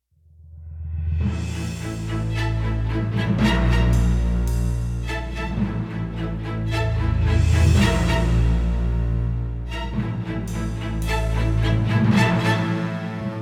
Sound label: Dangerous Theme TENSION LOOP